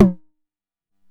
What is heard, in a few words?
909 TOMS; Wizoo - Platinum 24 Electronic; 909 TOMS P1